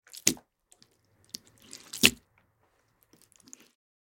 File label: Sloppy food squish baked beans; cooking